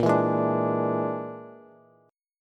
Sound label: A sharp 7th Flat 5th, Bass chord; strummed; 18,19,18,19; JGuitar